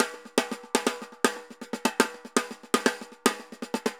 TAMBOR, MERENGUE; Best Service ProSamples vol.14; AKAI

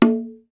bongo, Roland; Club-50 - Foundations Roland; Hybrid Kits; Thin 5

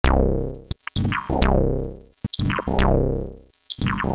Bass Note On Bar; AMG - Prototype Drum & Bass

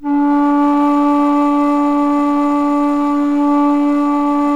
BASS D2, BASSFLUTE; USB Soundscan vol.23

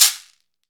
CAXECERE, Latin Shakers

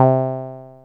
ACID C3, Alpha Dance; Best Service Alpha Dance vol.2

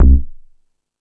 Hip Hop, Drum Machines E-MU Pro-Cussion

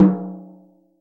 TOM, CTD TOM MLT

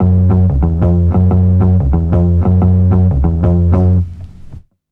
Funk Bass, Drum & Bass; Fluid Dynamics

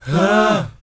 BOYZ F#, Vocal Planet R&B & Dance; ILIO

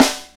snare, hip-hop rap, Roland; Northstar; Drumscapes Roland

